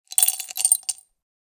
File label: Glass medicine jar putting pills inside